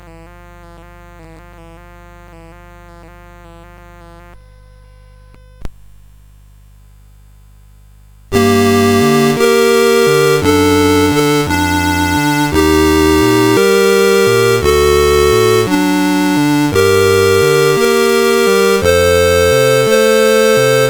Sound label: Armenian Lullaby, CSG8580